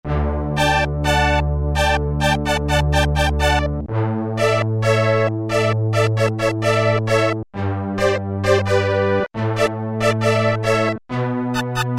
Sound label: Dance Brass, Roland SR-JV80-06 Dance